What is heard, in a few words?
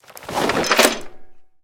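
noise of fallen from ammunition storage rack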